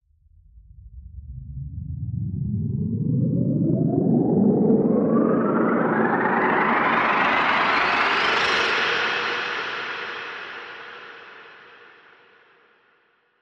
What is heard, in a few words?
Faint Flutter Rise